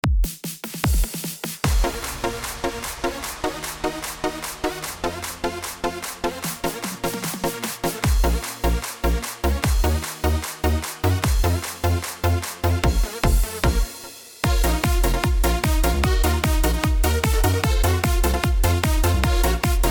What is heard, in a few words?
Style Yamaha; yamaha psr s770